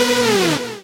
Synths H; Vengeance Samples

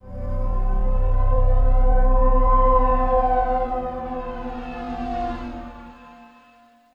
VFX Atmos

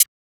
hat; Dubstep Sample Pack

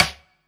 Minimal Perc; Minimal Percussion

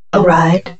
Vocal Phrases; Vengeance Samples; Vocals

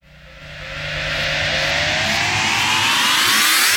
FX Uplifter

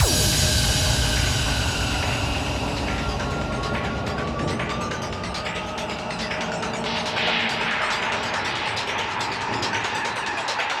140BPM FX 16 Bars; Epsilon; Vengeance Samples; Snares&FX Pairs

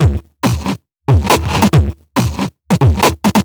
Beatbox Loops, Human Beatbox

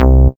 Bass C, Offbeat Bass

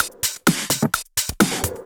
Houseloops 128BPM; Vengeance Samples

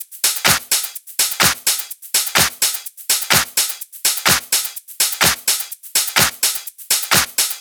126BPM Drums; Vengeance Samples; Malta Kit

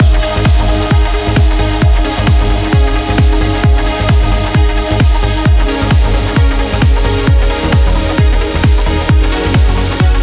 132BPM Complete Mix, G#major; Jericho Kit

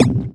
Synth Shots; Vengeance Samples

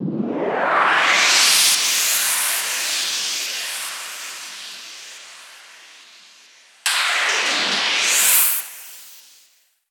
FX Noiz Lift, 140 BPM; Vengeance Samples; Vocal-Hook Kits; Song Kit

